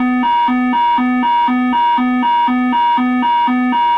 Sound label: master warning; pmdg